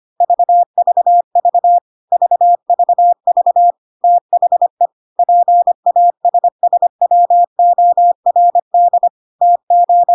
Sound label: beep